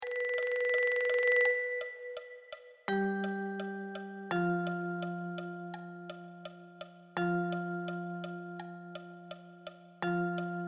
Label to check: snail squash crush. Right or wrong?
Wrong